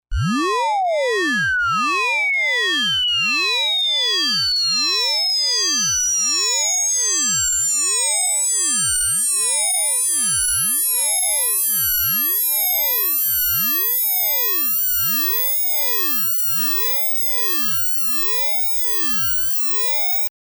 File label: sweep 20 20k